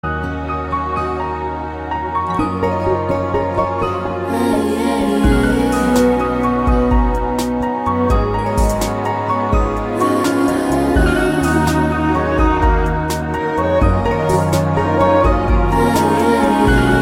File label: Love BGM; Ranga; Tamil